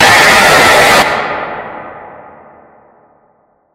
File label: jumpscare sound